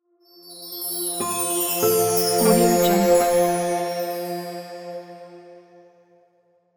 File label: fairy intro; teasound